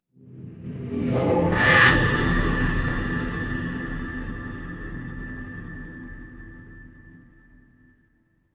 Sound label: horror stinger